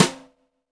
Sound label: Brush Slap, snares